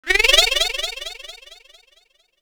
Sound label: Moon Jump